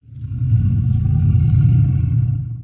forest hidden, creature; stalker

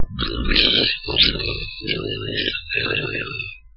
alert; sawer; boss

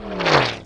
bullet L to R slomo, near miss; weapons

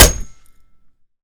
golf hit, golf club; weapons; melee

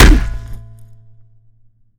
shovel hit; weapons; melee

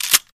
pickup; deathlegends

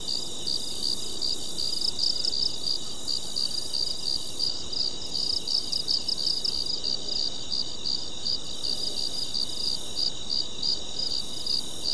forest night

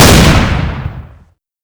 sawed off fire, weapons

